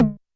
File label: Tom Analog, YAMAHA RM1X